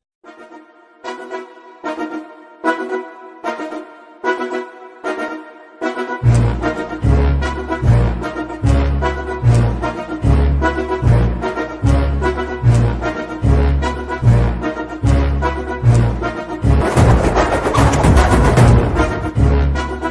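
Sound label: intro background music; rrr; alluri sitaramraju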